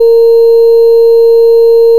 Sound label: additive voice single; FFTimbre